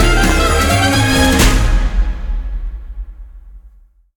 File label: big Win Loop End; secrets of christmas